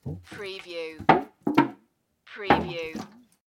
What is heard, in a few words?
Gas Can Buckle Metal